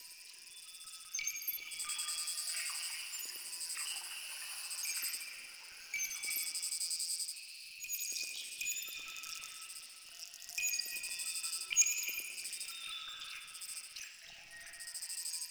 Critter Ambient Stereo Loop; Props; Warframe; Tau; Ambience

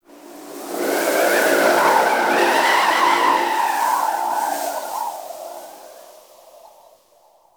Wind Gust Med, Venus Landscape; Warframe; Venus Open World; Ambience

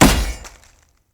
Great Sword Melee Impact Wood; Weapon Impacts; Player; Swords; Environment; Founders Great Sword

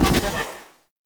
Gun Beam End; Warframe; Weapons; Thano Tech Arch Gun

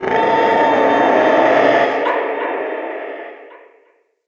Ext Dist Animal; Midrath Island

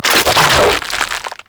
Ghoul Devourer Hook Tongue Projectile; Warframe; Grineer Ghouls

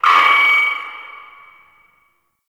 Announcement End; Lustratus; Interrogation

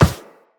Walk Metal, Footsteps; Operator

